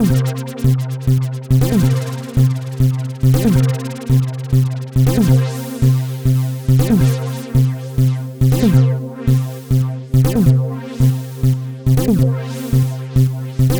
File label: Trance Synth; Sonic Foundry (Sony Creative Software); Trance NRG VOL-2; Trance Synths